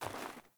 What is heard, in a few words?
Sandals Walk Snow, Footsteps; Characters; Player